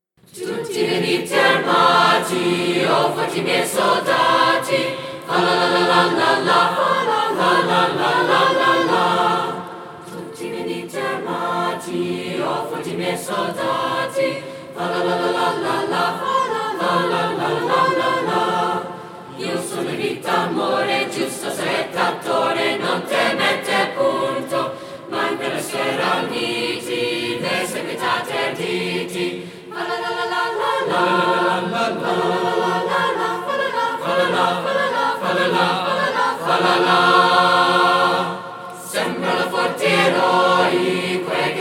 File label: Concert Choir; Amor Vittorioso; Giovanni Gastoldi, ed. Jim Leininger; Spring Music Festival